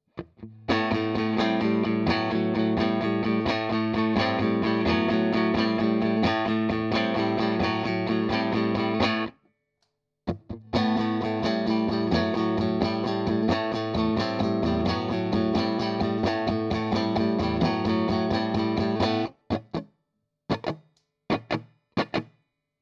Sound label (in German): Vergleich Rhythm and Lead Circuit